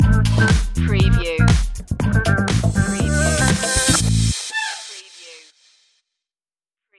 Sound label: salsa funk stinger